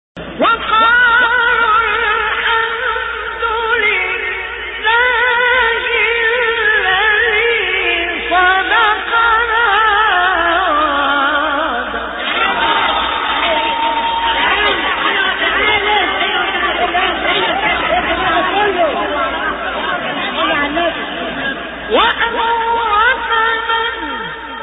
فراز شگفت انگیز آیه سوره; زمر; استاد مصطفی اسماعیل

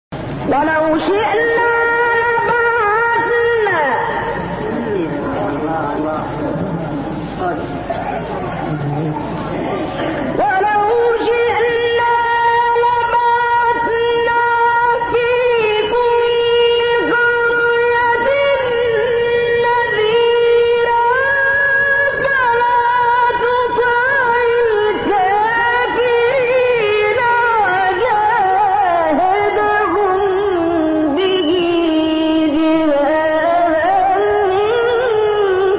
تلاوت آیه سوره فرقان; شعبان عبدالعزیز صیاد

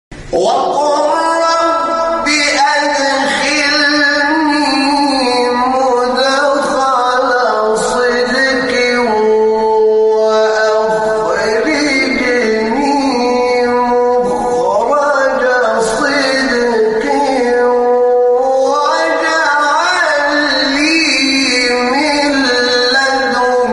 تلاوت آیه سوره اسرا; 80; حامد شاکرنژاد

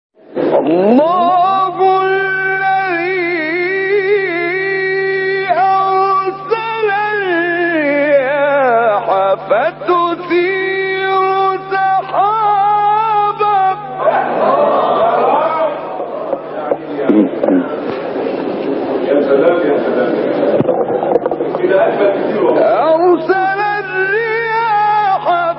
تلاوت زیبای آیه سوره فاطر; آیه 9; محمد احمد عمران

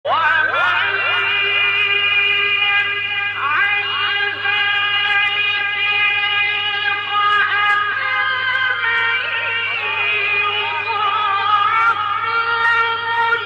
تلاوت زیبای سوره فرقان; آیات ۶۸ تا ۷٠; استاد عبدالباسط

